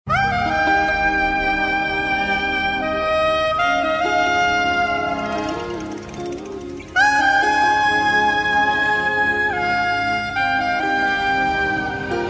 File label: romantic flute